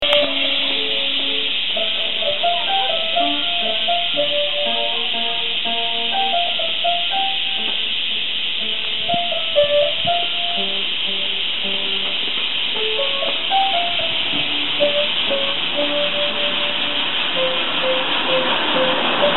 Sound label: Kyoto Piano Lesson Cicadas